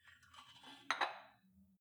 weight rack insert weight soft

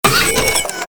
electric door

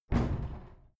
cupboard kitchen close medium

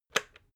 water boiler switch on

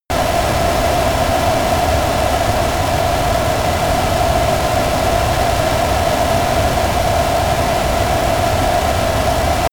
military jet flanker-c turbine full power close

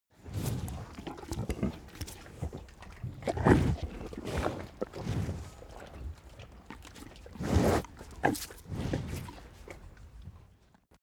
cow eat breath

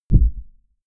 bass impact short bag

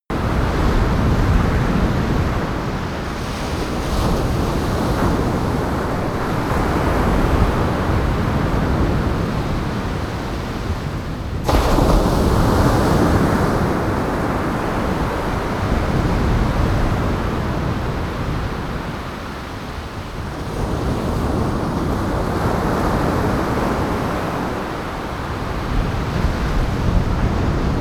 coast loop beach strong

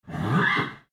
horse running off

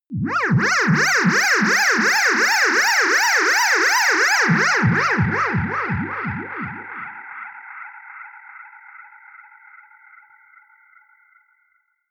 ululation ufo

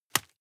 juggle catch hard

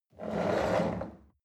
chair wheel roll on floor medium

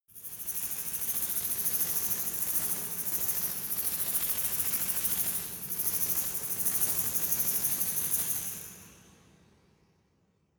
resonator rattle

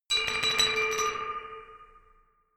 glockenspiel dark tremolo low short

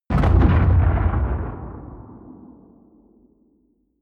bomb rumble distant

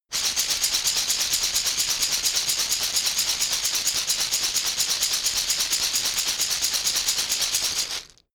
shaker wood rough rattle slow hard

